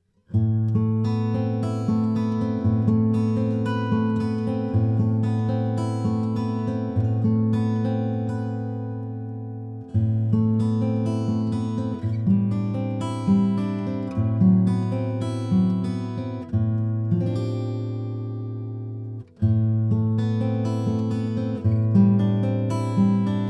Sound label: Acoustic Guitar WITH AIR BAND at 10K AKG C414